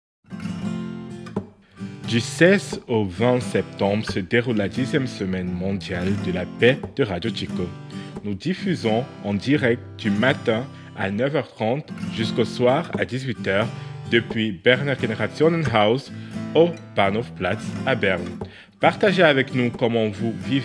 Jingle

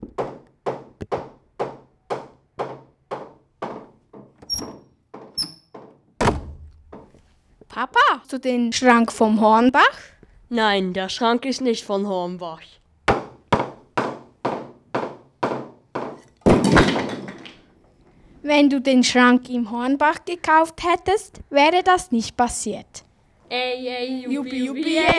Werbe Jingle; St.Erhard; Hornbach

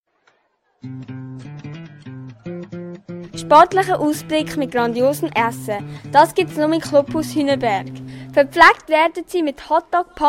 Clubhaus Jingle

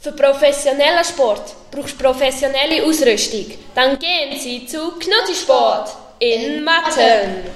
Jingle; knutti sport; Unterseen

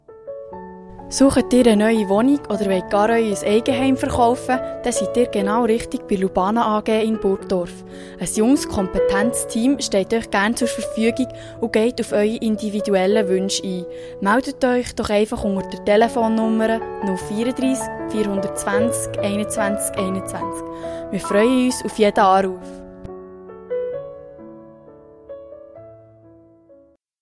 Jingle; Lubana

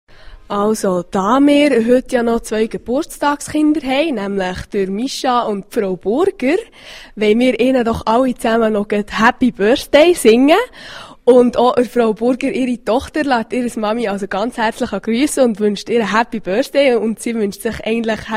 Happy Birthday, ganze Klasse; Schule Spiez

